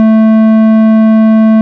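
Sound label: ORG, Farfisa organ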